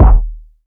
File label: verby kick sample D# key; Duration 0:01; kick drum samples; one shots